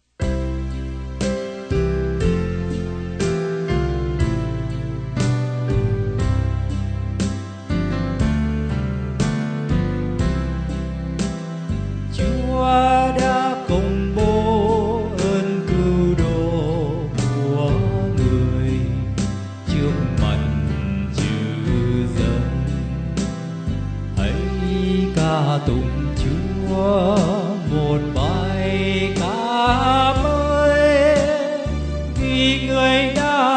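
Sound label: soprano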